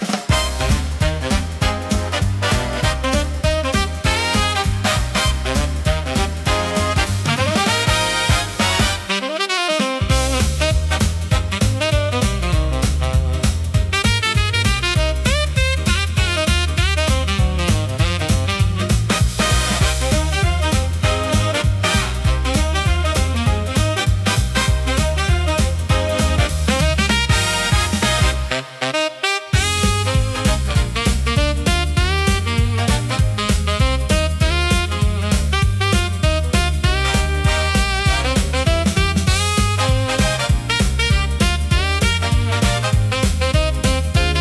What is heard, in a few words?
electro swing pop